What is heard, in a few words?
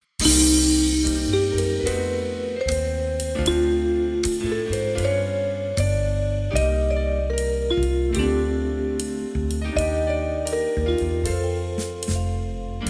Flute; flute performance